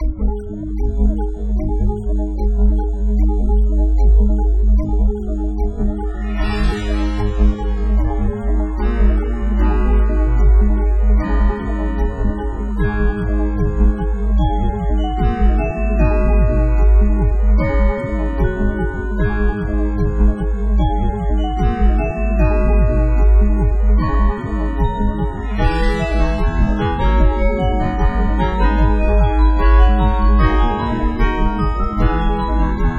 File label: sinusoids